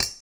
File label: arrow impact metal; attack impact; 0ad